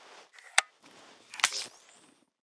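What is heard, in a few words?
Weapon alien blaster reload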